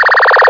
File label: Chirp Wave; cephalotron2